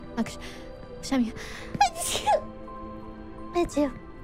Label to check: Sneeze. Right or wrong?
Right